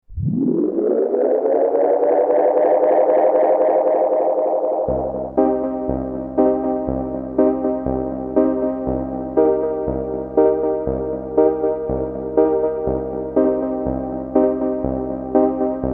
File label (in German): Echo Fix EF X3 Piano Tape On Off Effekte